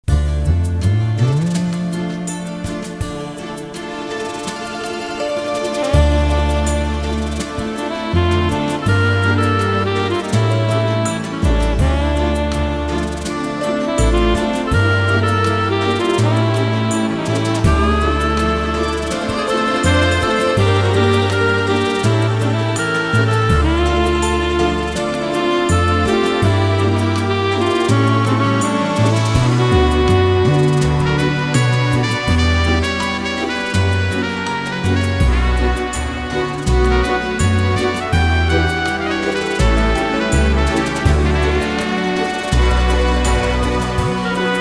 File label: BELL